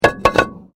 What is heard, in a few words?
Brick Medium Hollow Fall; Material components for constructing more complex sounds